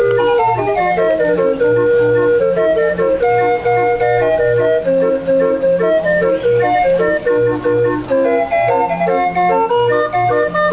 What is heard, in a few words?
organ music